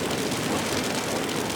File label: infernobomb loop; Ziggs